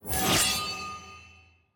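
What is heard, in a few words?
notif trade request received; champ select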